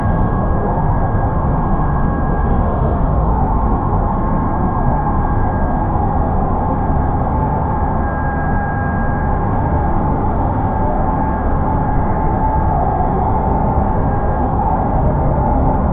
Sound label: the sounds of cyberpank, city